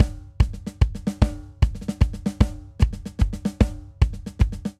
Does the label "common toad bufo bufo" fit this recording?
No